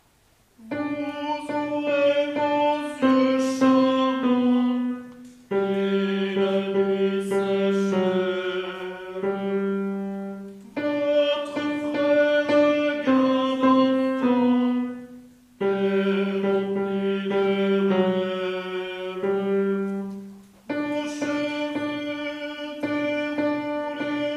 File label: Tenors; O ma belle Aurore